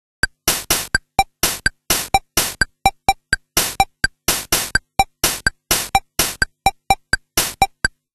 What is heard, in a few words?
CASIO VL-1 BOSSANOVA